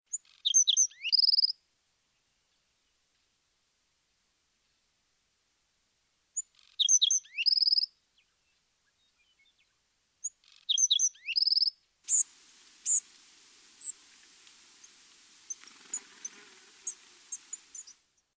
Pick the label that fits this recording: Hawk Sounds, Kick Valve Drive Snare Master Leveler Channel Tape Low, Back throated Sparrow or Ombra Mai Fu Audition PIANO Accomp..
Back throated Sparrow